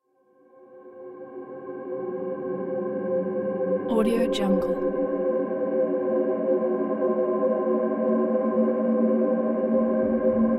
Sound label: suspense ambient